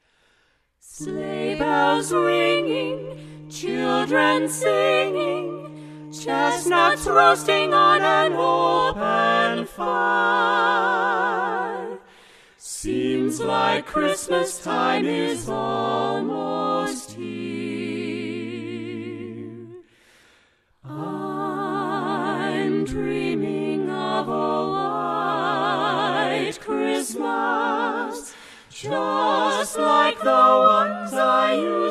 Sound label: Carolers; Minneapolis